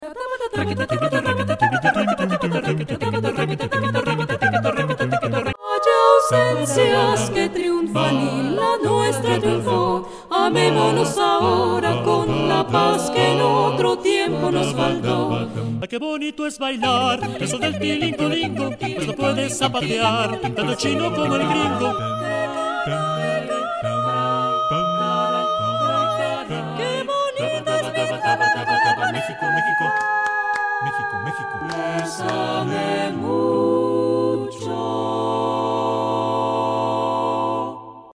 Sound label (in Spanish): VOZ EN PUNTO MIX MUSIC